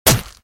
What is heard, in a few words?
Body Impact